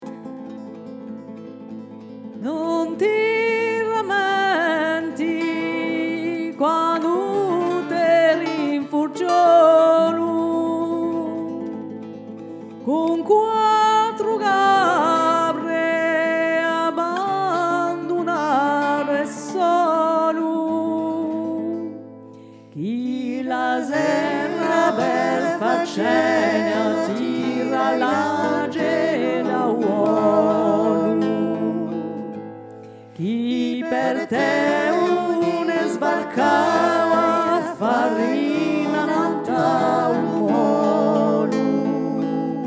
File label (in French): 3 VOIX; repertoire de a manna